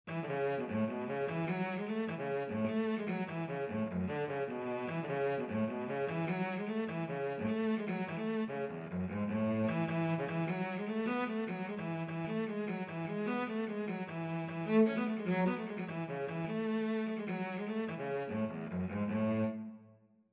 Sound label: cello